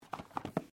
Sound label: BOOK MOVE, Book movement; Books; Offices